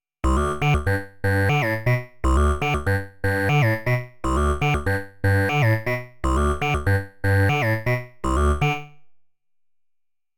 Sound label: Studio Electronics Quadnic 4 Waves